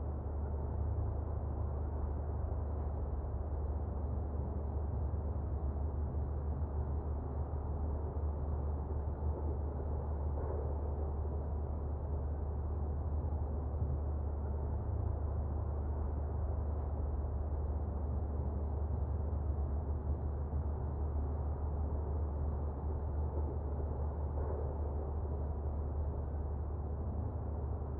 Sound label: city ext, ambient